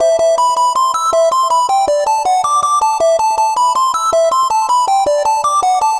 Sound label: Lead Arp, Drum N Bass, Keys Pads; Sonic Foundry (Sony Creative Software); Knutrix Drum & Bass